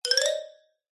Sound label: cartoon xylophone short fast ascend; zapsplat